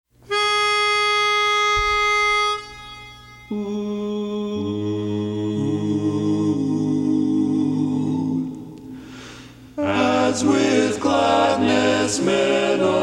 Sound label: baritone, A Capella; as with gladness men of old